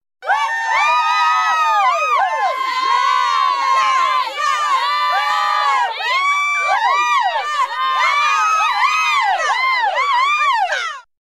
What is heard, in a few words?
cheering small group of women